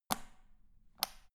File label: power button turn on off hard